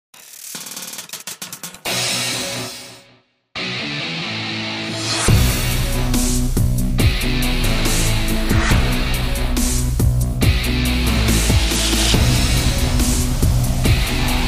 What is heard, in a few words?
Frightening Reflex